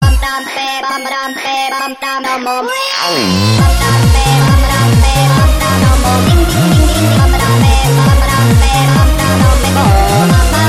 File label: frog remix; animals